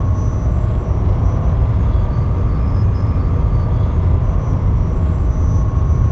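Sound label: door large Hangar loop; Theed; Star Wars Obi-Wan; XBOX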